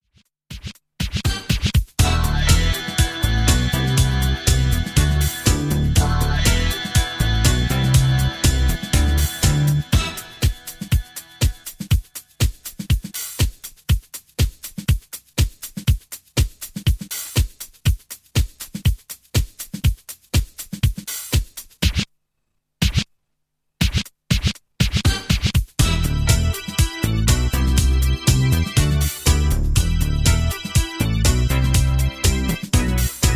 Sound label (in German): Schweine Rap Playback